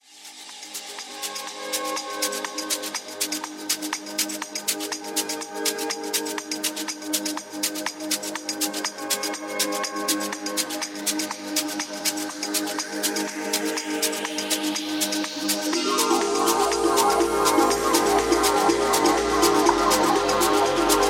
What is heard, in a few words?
Dance Trance